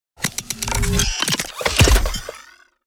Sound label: Cybernetic transformers robot transformation